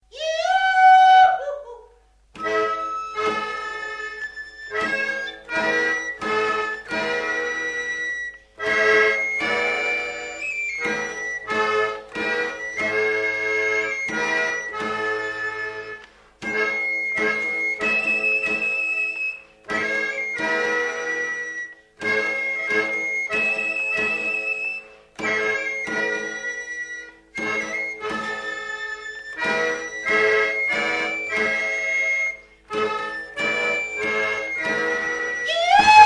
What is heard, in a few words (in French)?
flute; au reng deus bienuros